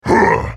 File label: effort; Maokai